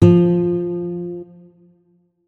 notes, guitar; Hideout Furniture

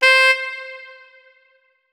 notes, keyboard saxophone; Hideout Furniture

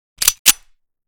tt33 unjam; weapons; Blindsides Reanimation Pack

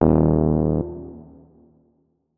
notes, keyboard, electric piano

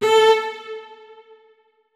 notes, keyboard; Hideout Furniture

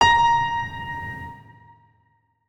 notes, keyboard piano; Hideout Furniture

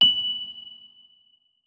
notes, keyboard, electric piano

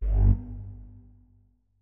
flute notes; Hideout Furniture

